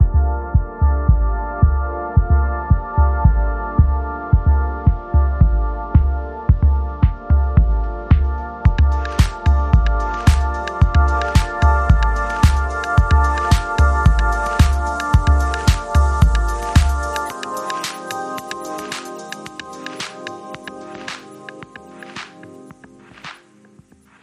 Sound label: Driving Pulse